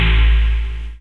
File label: Spang Bass; Sonic Foundry (Sony Creative Software); Ugly Remnants VOL-2; Basstuff